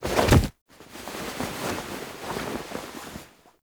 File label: Foley Body Fall; Ostron; Warframe; Quests; The New War; Narmer Outpost; TNW Snt Chamber Archon Intro